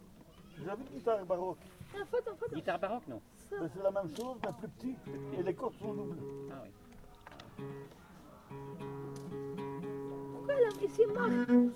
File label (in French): Interlude viole de gambe